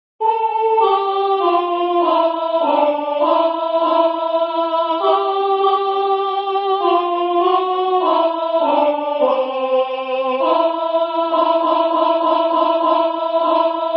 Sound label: alto